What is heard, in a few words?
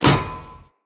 Polearm Catch; Weapons; Player; Sphere